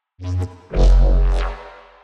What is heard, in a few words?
neuro, bass